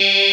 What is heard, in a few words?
TALKR FUZZ, BASSES; Pro-Rec - Synthsonic